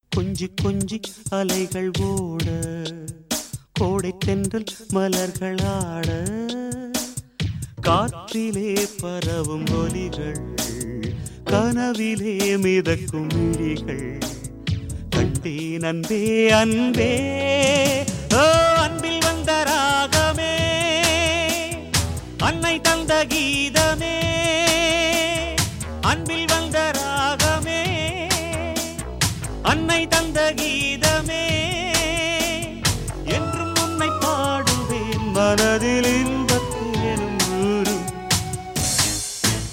hits

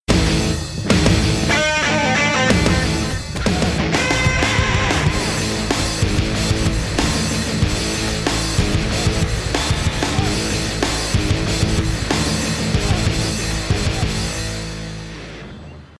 bgm

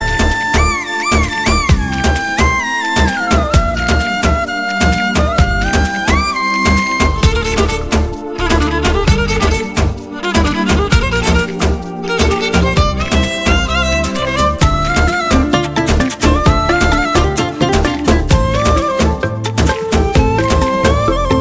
love bgm; telugu

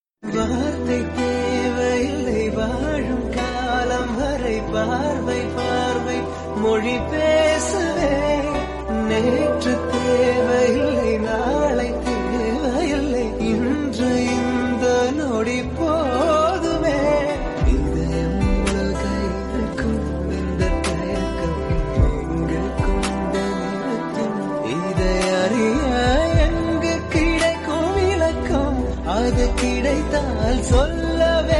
romantic tamil love song

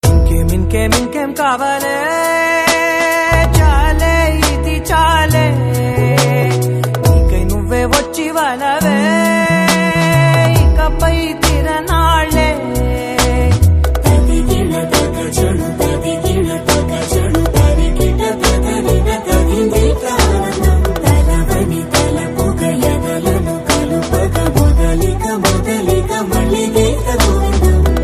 ringtone; telugu ringtones

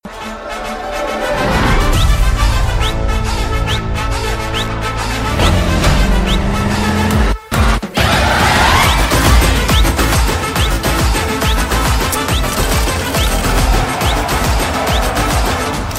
malayalam bgm; minnal murali

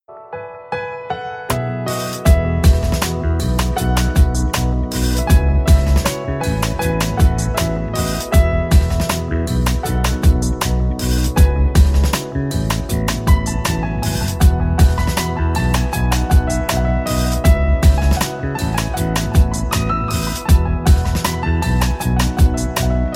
piano ringtone; tamil; naanum rowdy dhaan

